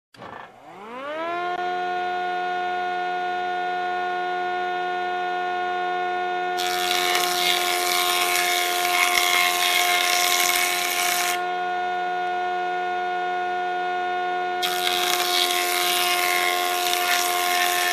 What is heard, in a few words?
Construction